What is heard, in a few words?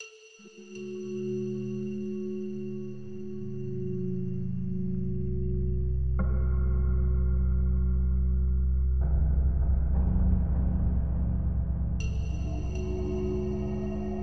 factory ambience